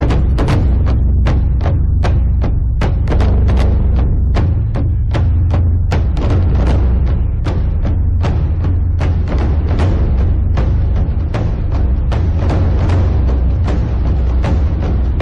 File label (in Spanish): tambores; Escuadron